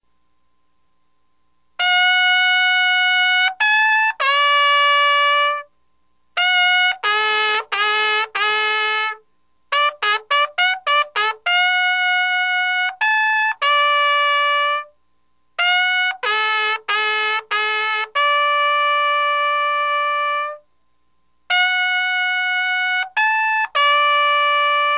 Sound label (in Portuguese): alvorada